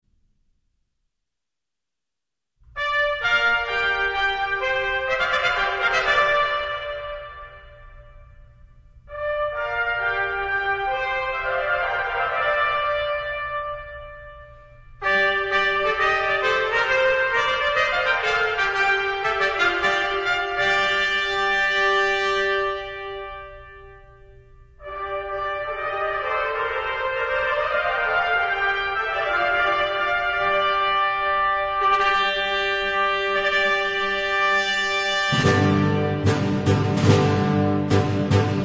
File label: Brass, Medieval Carols